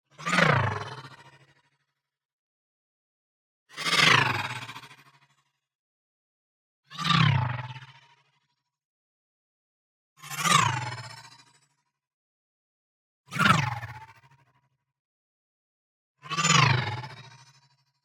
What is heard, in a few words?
NEON WHOOSH SMALL FAST Granular Tremors; boom library